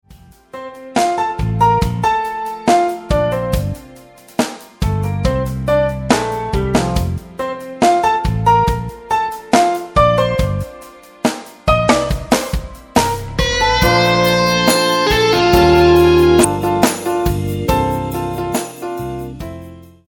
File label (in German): langsam Tempo